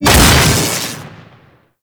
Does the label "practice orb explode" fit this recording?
Yes